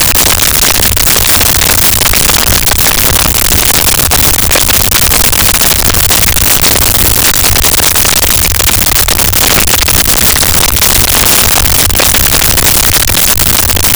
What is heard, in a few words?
Low End Rumble Loop; Low End Sweeteners